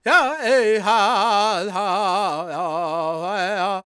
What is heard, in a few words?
G# MIN, World Voices, LAKOTA